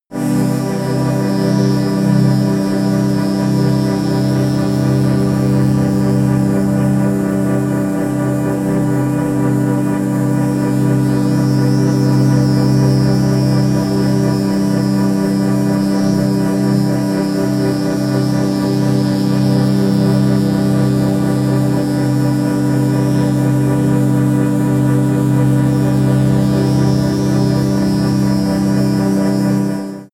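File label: chill air, pads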